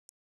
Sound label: 3 tones